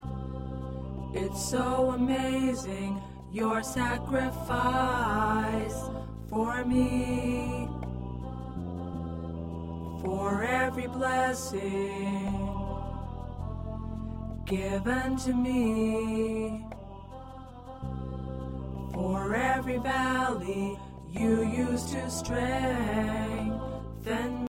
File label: Tenor